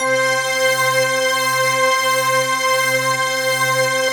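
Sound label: C 5, BELL STRINGS; Best Service; Advanced Media Trax Modern Composer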